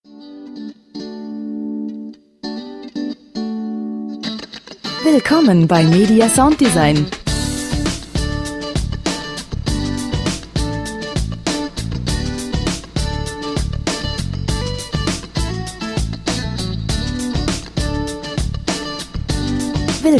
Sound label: rock westcoast